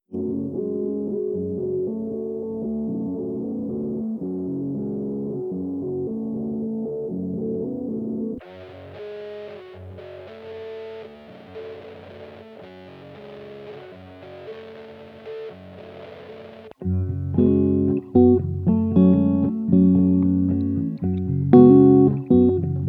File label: Loop I Variation 1, looping